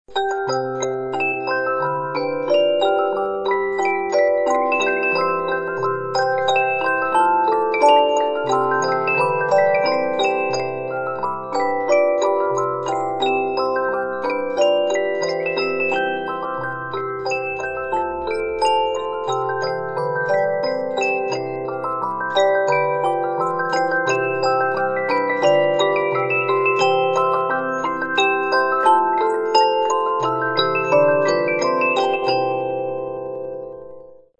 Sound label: reuge 72 note